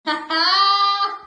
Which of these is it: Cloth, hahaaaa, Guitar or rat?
hahaaaa